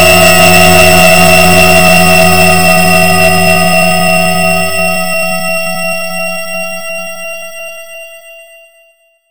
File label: Retro Weird Psych Long